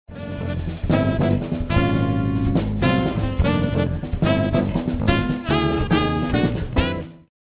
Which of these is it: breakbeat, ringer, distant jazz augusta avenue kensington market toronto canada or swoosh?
ringer